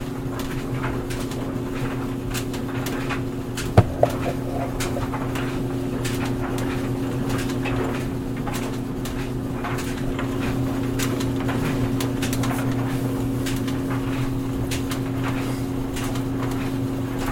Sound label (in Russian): sushilka rabotaet v prachechnoj